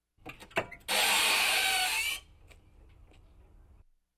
Squeaky Cabinet Door

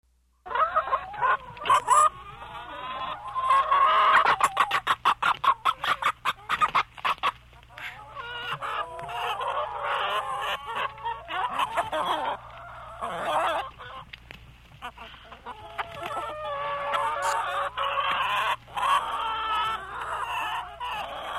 Chickens clucking

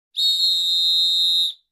Traffic Officer Whistle

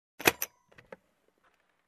Case opening spring click

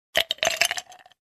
Few Ice Cubes put in drinking glass

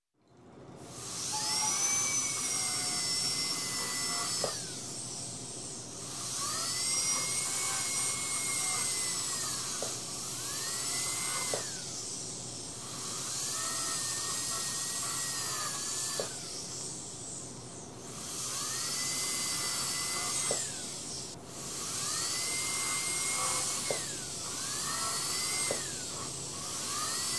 Dentist treating teeth

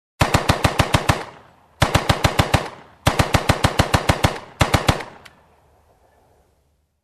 Machine gun shooting in room

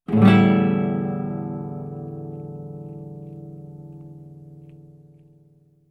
Classical guitar open strum

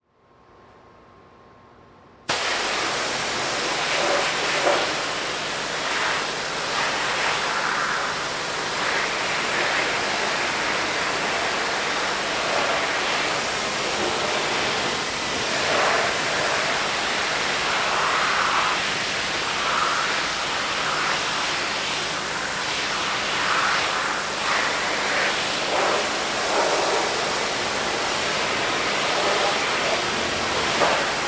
Pressure Washer

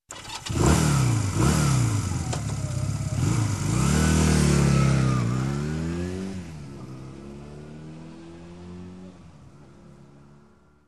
Bike engine ignition and drive away

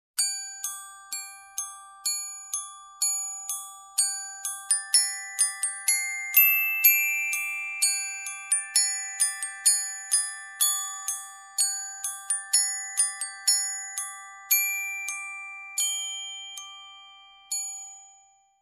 Sweet lullaby melody

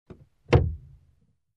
Car Fuel Cap Close

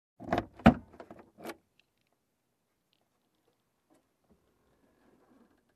Car Interior Door Open

